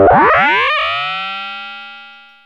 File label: loop 94; AMG - Vince Clarke Lucky Bastard